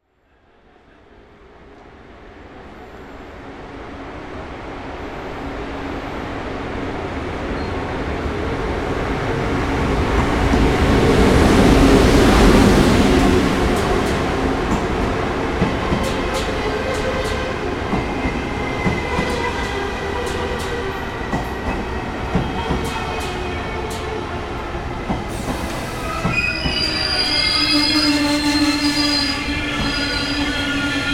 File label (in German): Bahnhof SBB